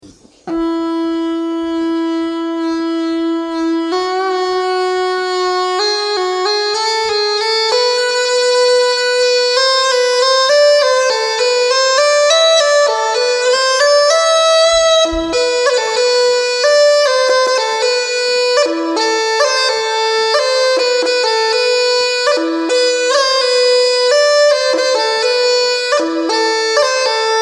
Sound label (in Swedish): solo säckpipa intro; Vanvettets lågor